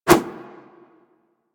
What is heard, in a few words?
flip whoosh; Warframe; Generic Foley